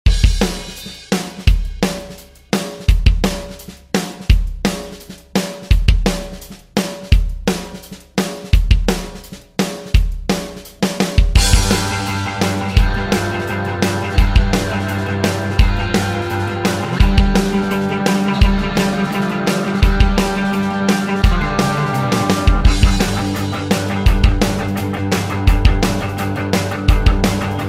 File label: Rock Redrum; Deejay Hit